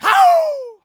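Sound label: voice zip kick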